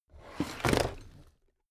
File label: drawer cabinet stuff open heavy